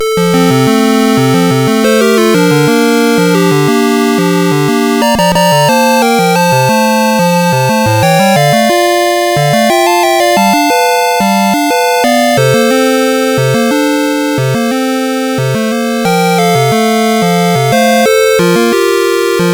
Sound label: Musicbox, sid CSG8580R5